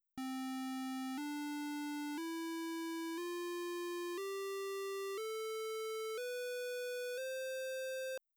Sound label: doremi